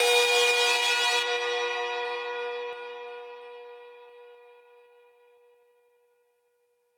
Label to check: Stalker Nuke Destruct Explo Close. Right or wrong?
Wrong